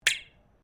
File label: METAL ON METAL RAILING; Metal (Sound Effects:Materials)